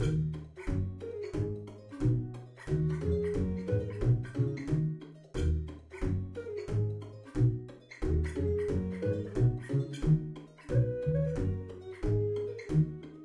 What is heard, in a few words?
level; goldentavern